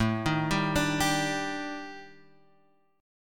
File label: D sharp Major 7th Flat 5th, A, guitar chord